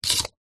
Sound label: household cd disc pick up from hard surface